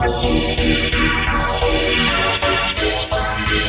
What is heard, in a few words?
instant 90s trance; SYNTH AND ELECTRONIC LOOPS